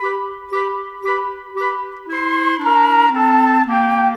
Clarinet, Flute Oboe, Orchestral Series Rock and Pop; Sonic Foundry (Sony Creative Software); Orchestral Series VOL-4 - Rock and Pop